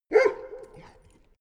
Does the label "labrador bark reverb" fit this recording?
Yes